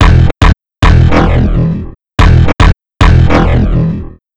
Bassline; Drums & Drones

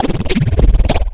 Bumpers; Sickly Fx; Sonic Foundry (Sony Creative Software); Esoterik Beatz

